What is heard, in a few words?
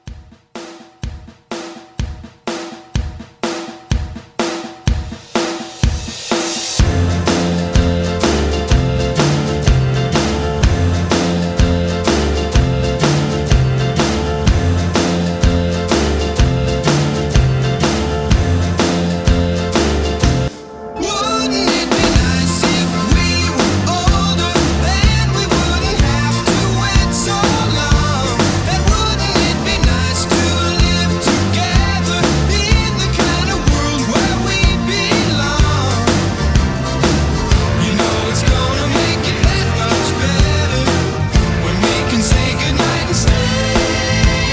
60s Rock Re Drum, 125